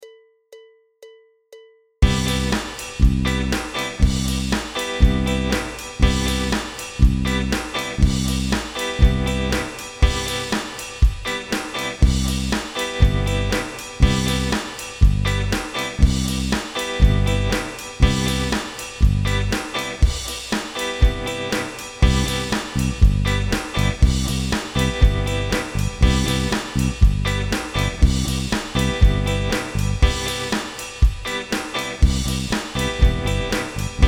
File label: impro cm7